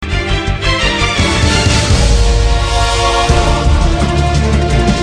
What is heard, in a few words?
Game Tune